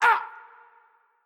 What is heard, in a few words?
hitreact human male small